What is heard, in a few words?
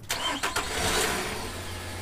car engine start